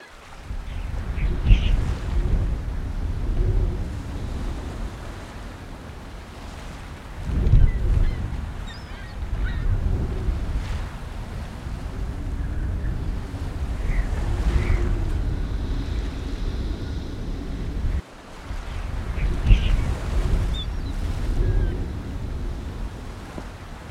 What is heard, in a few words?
The Wind Babies Relaxing Ocean